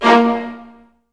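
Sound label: viola marcato A#2 left, Virtuoso Strings; ILIO; Kirk Hunter Virtuoso Strings VOL-2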